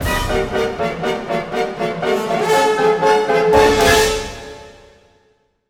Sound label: Orchestral Loops; 128 VERTIGO